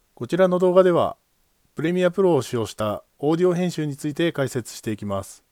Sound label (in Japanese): ピッチシフターセント100トラック適用